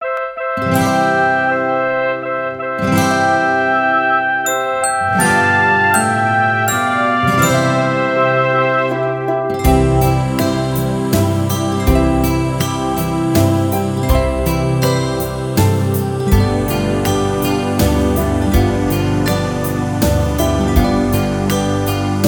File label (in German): Guten Abend gut Nacht To play along with, recorder